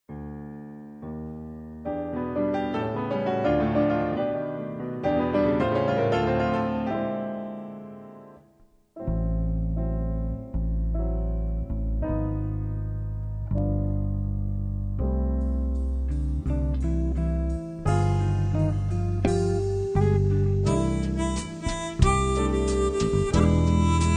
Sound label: jazz brazilian nights, bossa nova; sampler bossa nova